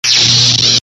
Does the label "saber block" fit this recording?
Yes